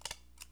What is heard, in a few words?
button